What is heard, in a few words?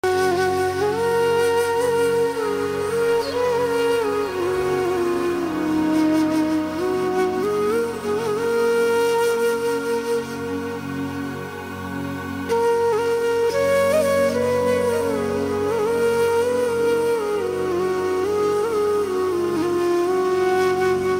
flute; naat; islamic